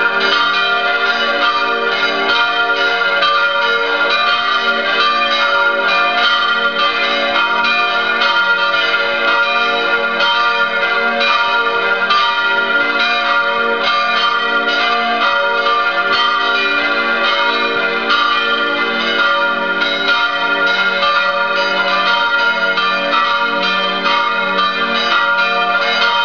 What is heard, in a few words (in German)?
Kirchen, Pupping, St Wolfgang